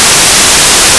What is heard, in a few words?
noise